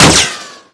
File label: impact riotshield; weapons; Modern Warfare